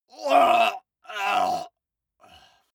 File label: male groan painful triple; menschen; schreie